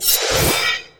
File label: New Weapon; Legend of Keepers; Imja